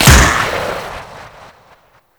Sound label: Infested Dart Pistol Shot; Warframe; Weapons